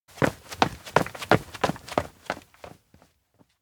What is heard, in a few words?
steps outdoor mainst run; anemoi